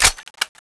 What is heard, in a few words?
ar2 reload push; HL2-Nerv; melts